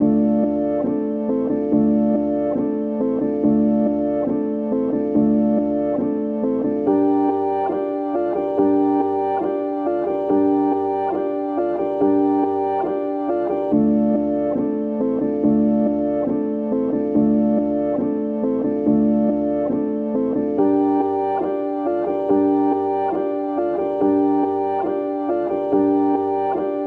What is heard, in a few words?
140 BPM D Min Piano, Drill; Loop Stems; Cymatics; 2022 Melody Collection - Drill; Woi